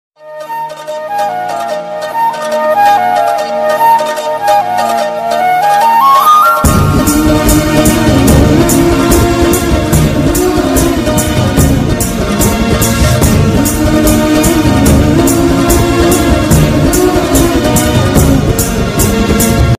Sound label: love bgm ringtones tamil; polladhavan